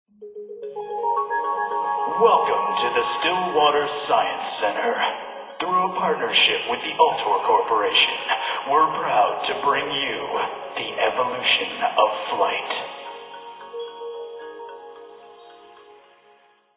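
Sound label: Music Ambient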